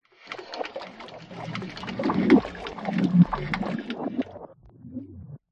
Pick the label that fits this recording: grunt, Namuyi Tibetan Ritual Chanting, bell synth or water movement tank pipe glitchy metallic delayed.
water movement tank pipe glitchy metallic delayed